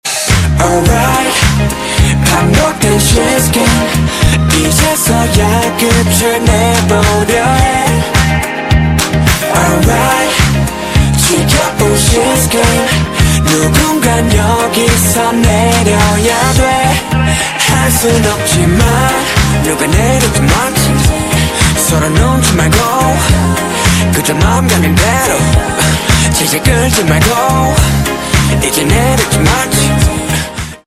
korean ringtone

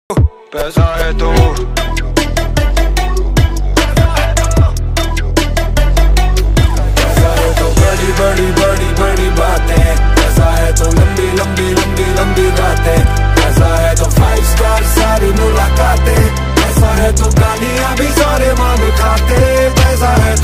hindi ringtone

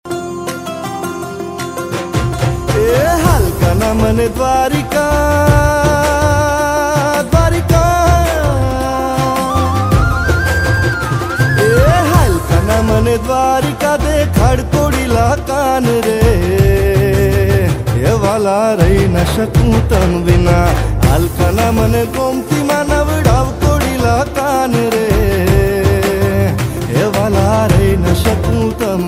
gujarati ringtone

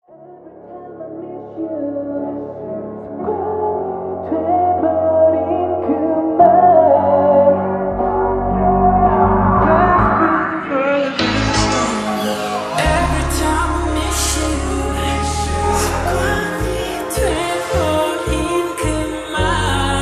slowed korean ringtone

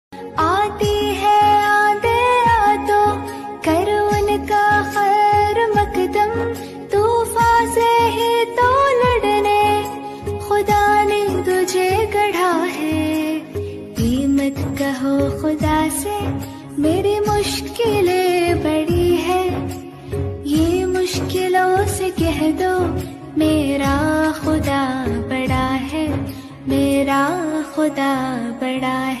islamic ringtone

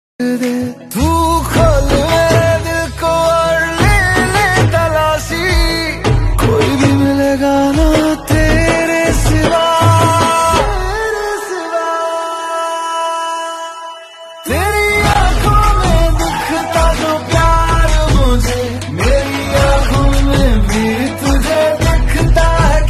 hindi ringtone

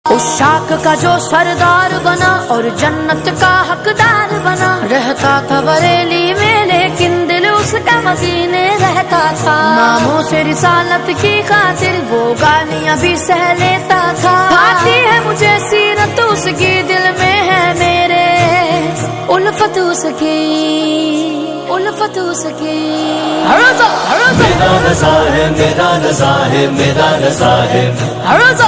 islamic ringtone

